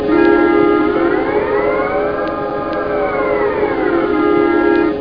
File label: kidding sound